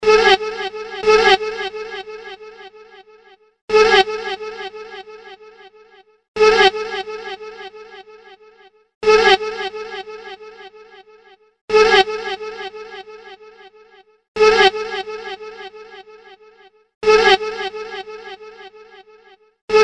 Oh Eh, voice FX

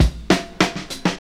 Fills, Roland; Sound & Vision - Gigapack I; Perc

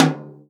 tom, Real Drum Kit, jungle kit

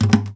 KNOCK, Nylon Guitar

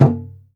GENDANG B3, DRUM; Spectrasonics; Heart of Asia

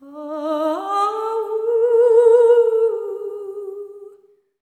LAMENT

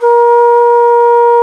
T. BANSURI; E-MU Producer Series Vol. 7 – Old World Instruments

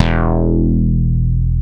MINIMOOG; Greytsounds VOL-1

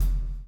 CHAIN; Spectrasonics - Backbeat

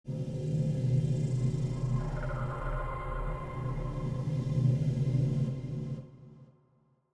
Suspence Atmos, cinematic soundbeds; musicradar

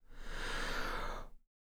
INHALER, BREATH FX; Spectrasonics; Vocal Planet; Jazz FX

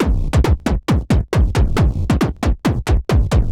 Noise Kick 136, horrorscape samples 136bpm

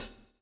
SWNG

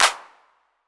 808 CLP; Wizoo - Platinum 24 Electronic; 808 SOFT P2

